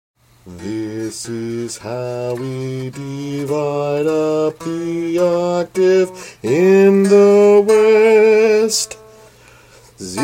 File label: chrom scale